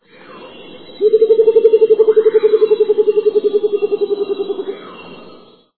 udu-de-coroa-azul

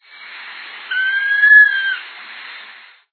bico chato de rabo vermelho

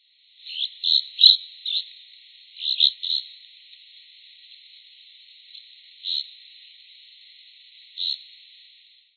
andorinha do rio

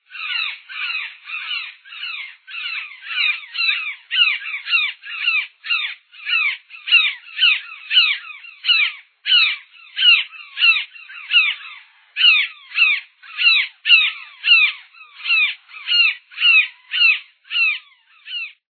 gralha azul

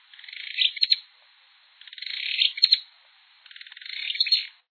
barulhento

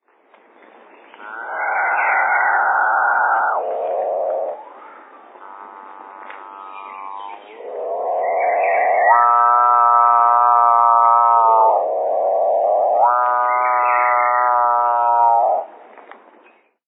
passaro boi

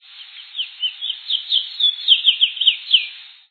curio

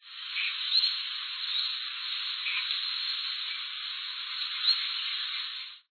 anambe de coroa

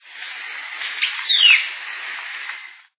coroa de fogo

